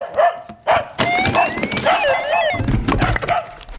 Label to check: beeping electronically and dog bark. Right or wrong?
Right